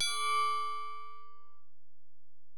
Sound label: Bell, Alesis DM-PRO